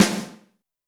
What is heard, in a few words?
snare, Zoom MRT-3B